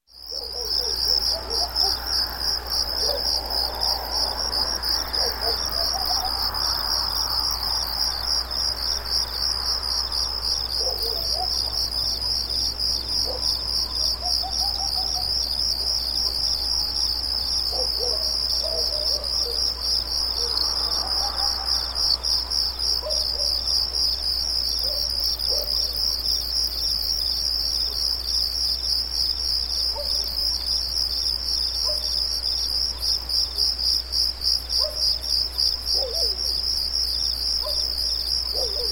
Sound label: Night Ambience Light High-pitched Crickets Rhythmic; Nature Ambiences